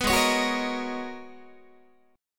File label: G sharp Minor 11th G sharp Guitar, chord; G#m11 strummed; JGuitar